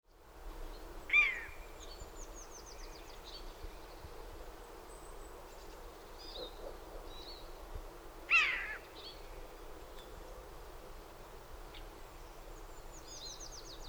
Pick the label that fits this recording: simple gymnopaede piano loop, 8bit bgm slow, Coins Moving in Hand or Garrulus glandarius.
Garrulus glandarius